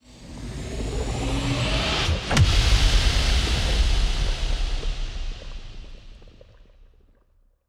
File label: toxic planet hit; weapons; Stellaris; toxoids